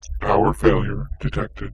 Low Battery Alarm

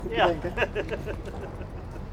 Laugh Male Nl Street

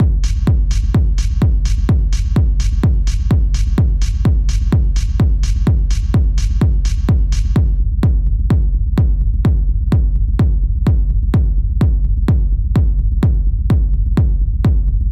Techno Rumble Beat 127 Bpm

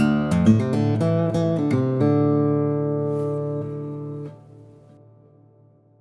Dadgad Riff

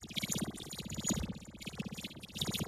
Portal spawn Adventure

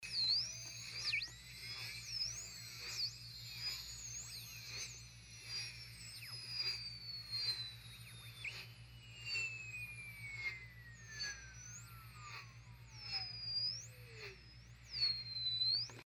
Radio Frequency

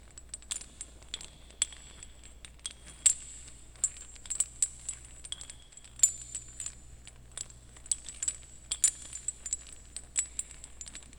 Chain loop Louder Reverb

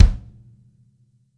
Bass Drum F

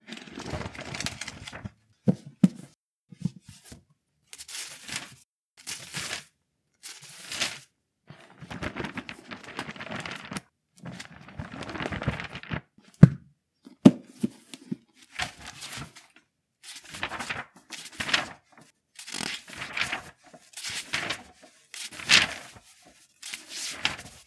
Book Open Close Flipping Pages

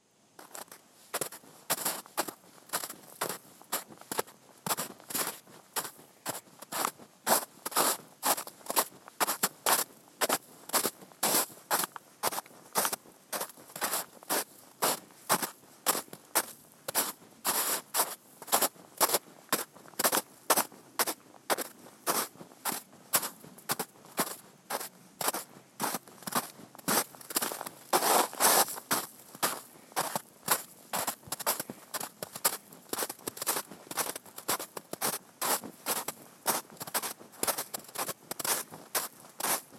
Walking Through Snow

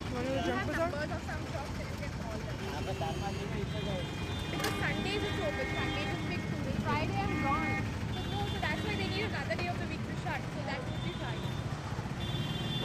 Market Streetfood

